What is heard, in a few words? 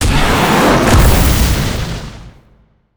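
boss attack; monster; grogo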